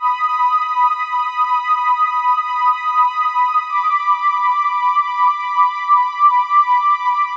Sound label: String, El-House